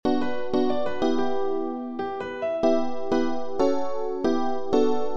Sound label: sample loop Minor